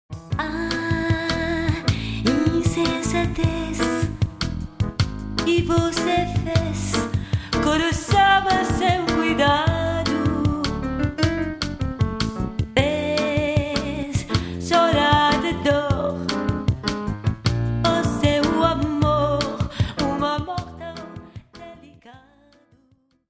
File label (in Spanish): bossanova